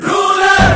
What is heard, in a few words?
Ruler